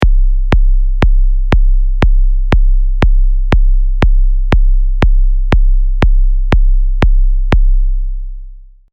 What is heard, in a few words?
RAW Kick